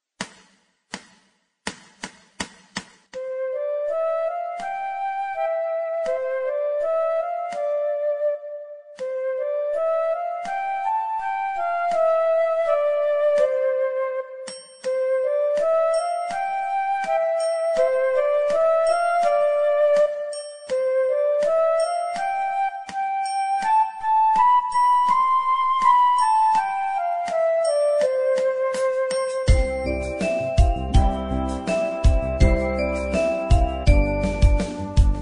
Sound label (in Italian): La scala musicale